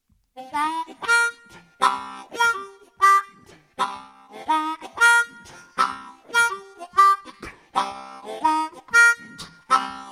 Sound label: harp contest instrumental, blues harmonica